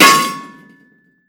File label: clang, CLANG